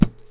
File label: baseballbat; hedgewars